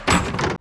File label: door wood close; mechanics; Spearhead Demo; MacFormat 2003 June